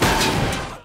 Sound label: tv slam; Cloudy with a Chance of Meatballs - It's Raining Man